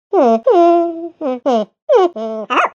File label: hmm